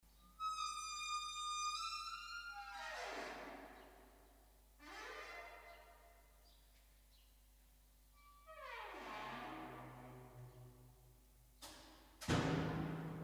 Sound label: Flute Sonata in A Minor; I. Poco adagio